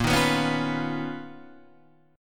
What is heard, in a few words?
B Major Sharp 11th Bb